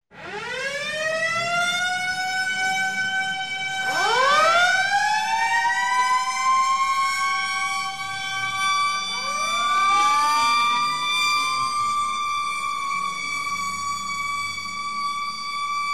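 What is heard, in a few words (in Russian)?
звук старинной сирены автомобиля экстренной помощи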